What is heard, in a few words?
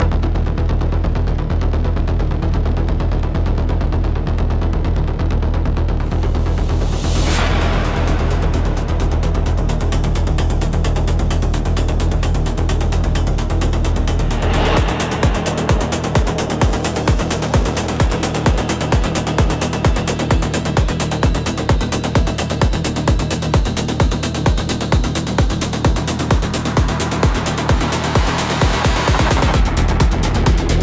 Neural Distortion